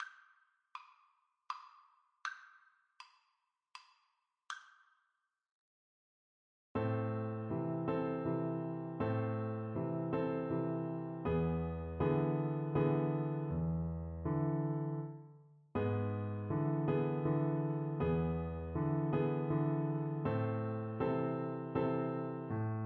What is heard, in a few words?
la partida FL kar, Flute